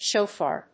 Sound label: Shofar